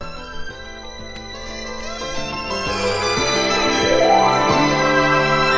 jazzy fade in intro

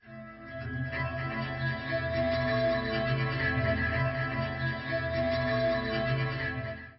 Alien Minstrals C2, PADS and STRINGS

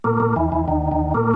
organ lick; Organs

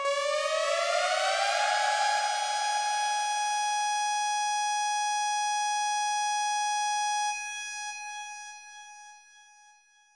cheesy siren blast; Alarm Sounds

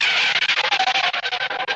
vintage broken skid, Cartoon Sound Effects; Cartoon Sound Effects Kit

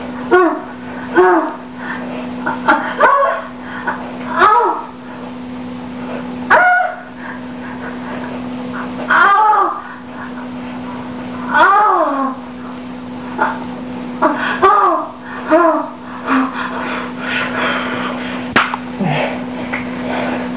load and hi orgasm spank, Silly Lo-Fi Orgasms

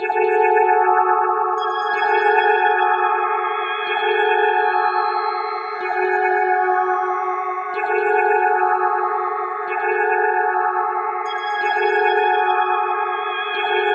bad dream melodic pulses; SYNTH AND ELECTRONIC

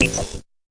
fx drum

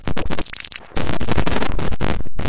distorted liquid phase; LOOPING AMBIENCE

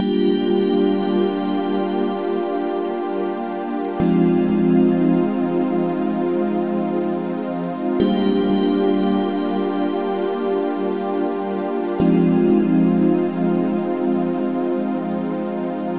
120 wide soft synth pads; STRINGS ORCHESTRAL CLASSICAL LOOPS

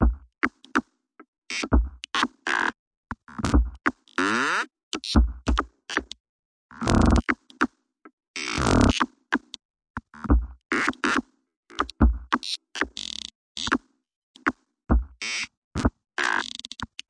140 buzzy blippy offbeats, drum loops and breaks

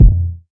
classic hip hop kick; Classic Hip Hop Mega Kit

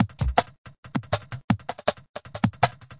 160 thin thuddy ploppy, drum loops and breaks

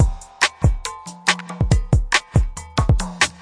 140 musical rides break, DRUM LOOPS and BREAKS

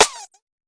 tight hit, Tight n Hard Kit; Hip Hop; STYLE KITS (Hip Hop, Etc)

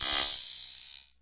electronic buzzfly hit; Weird and Interesting Hits; single hits

